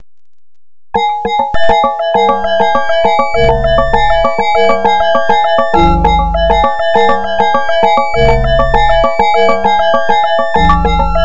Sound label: kotekan